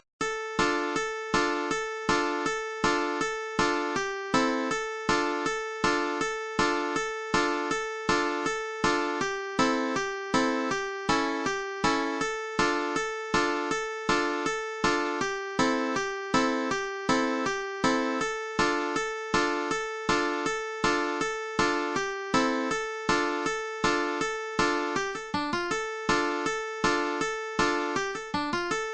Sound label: Gold Watch Blues Slow, uke; Fingerstyle Ukulele; Soprano, Concert and Tenor ukuleles